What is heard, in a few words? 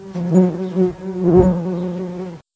insect buzz